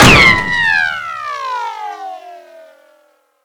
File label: armor rico; gmod; lvs framework